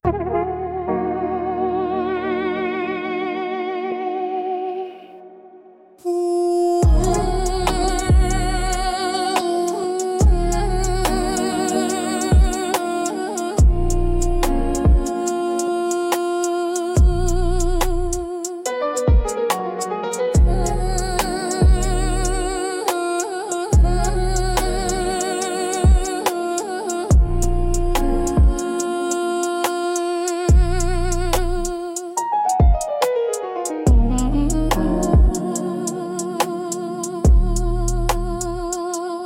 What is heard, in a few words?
Rain Field Lofi Beats